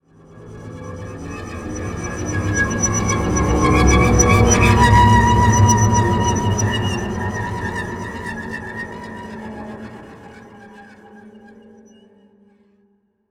River Tension; Outlast 2; PS4 Windows Xbox One

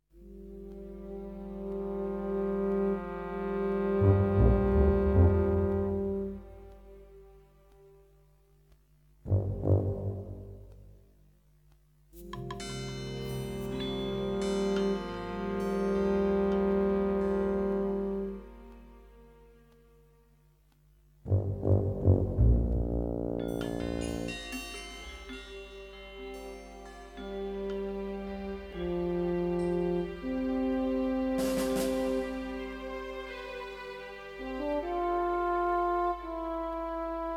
war ambience; Worms 3D

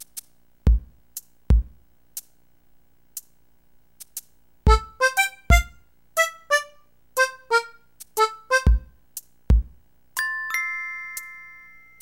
7 AM (Rain); animal crossing let's go to the city

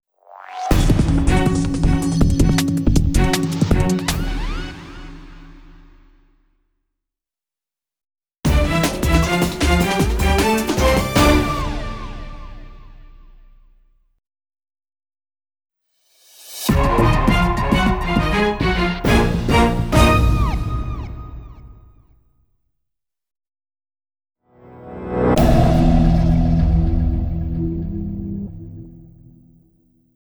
Various Jingles; METAL GEAR SOLID 3 SUBSISTENCE ''METAL GEAR ONLINE''; Metal Gear Survive

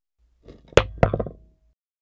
Metal Hammer Drop Wood Bench; Lucius III; pc linux

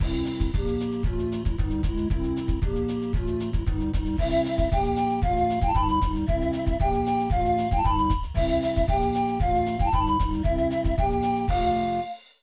Trophy Win; mario tennis; n64; Toad

